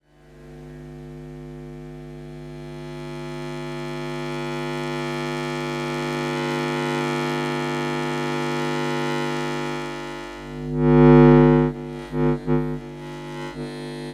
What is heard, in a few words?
Long Buzzing Power Glitch